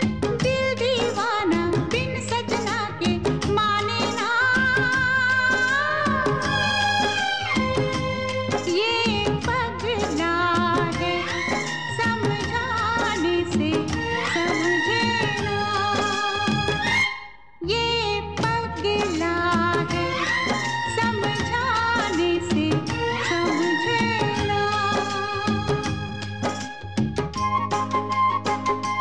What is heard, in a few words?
female; Indian